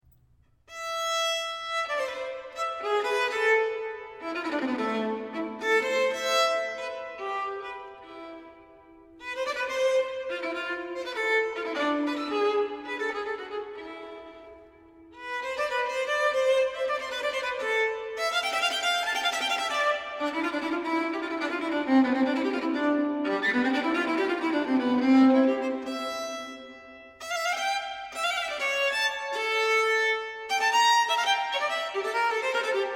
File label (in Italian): Fantasia improvvisata per violino